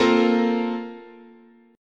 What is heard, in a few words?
A sharp Major 7th Suspended 2nd, guitar chord